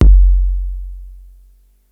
kick, Yamaha RY-8

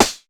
snare, Roland MC-909

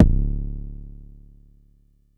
Long bd, Jomox Airbase99

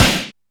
Alesis DM5 Snare; drums

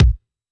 Boss DR880 kick; drums

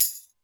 Tambourine; Alesis D4; drums

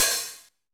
cymbal, Roland MC-909

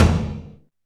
Tom, Alesis DM5 drums

